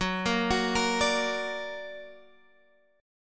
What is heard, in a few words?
F sharp Augmented, down; arpeggiated